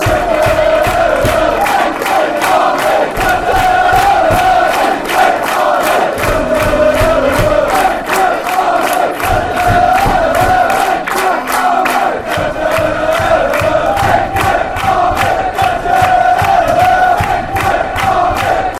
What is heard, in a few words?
de de de army, fan chants